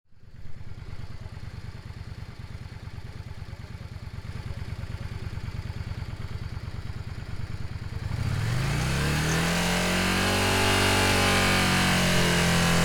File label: Static Yamaha Motocycle